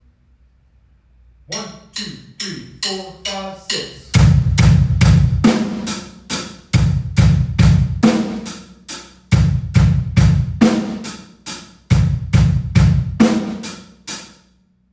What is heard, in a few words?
6 8 beat, drums